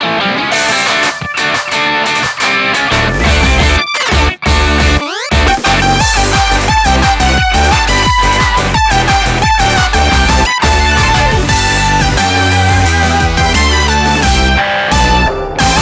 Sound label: instrumental ver.